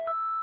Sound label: bip; BALLY